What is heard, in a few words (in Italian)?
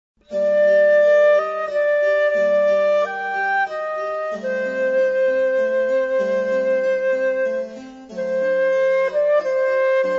Siciliano; Bach; repertorio para bodas